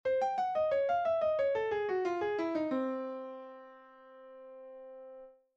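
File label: random melody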